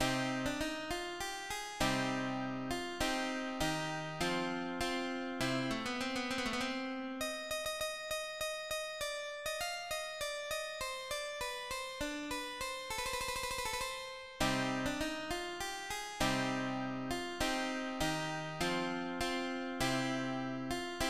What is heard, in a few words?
Galiard for 2 lutes